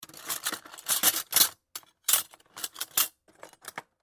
Silverware Remove, Silverware Remove From Drawer Spoon Knife Fork; Dishware; Household; Blastwave